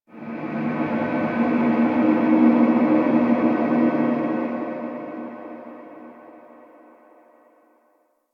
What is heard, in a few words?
Ship Energy Distant Texture Large; Ambience; Warframe; Corpus Ship Remaster